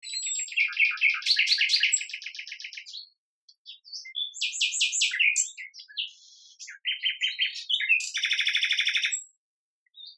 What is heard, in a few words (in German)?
Hecken Orchester